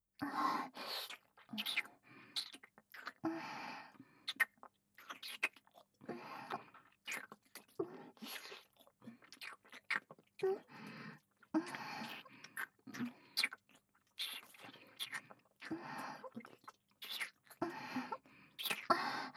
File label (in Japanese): W喘ぎ声